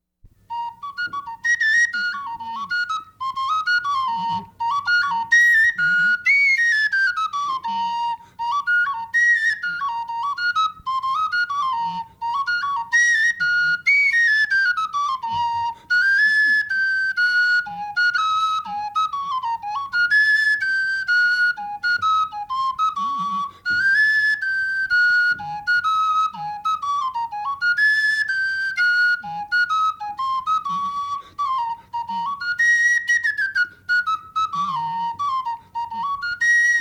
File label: Sicily Quadriglia Cane Flute; Italian Folk Music Collected in New York